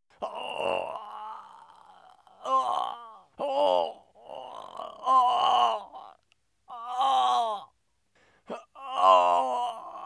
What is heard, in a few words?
Groaning Man, Scary Sounds; 1001 Sound Effects; Halloween Horror Sounds Effects